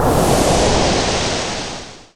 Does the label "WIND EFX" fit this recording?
Yes